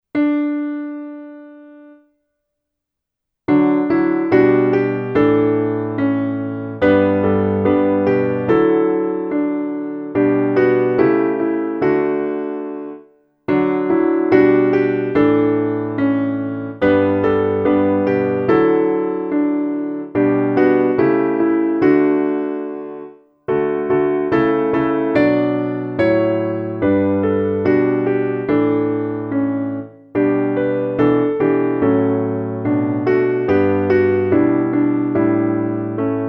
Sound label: Alleluia piano